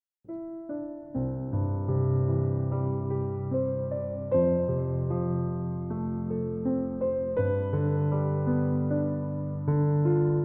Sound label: Piano Improv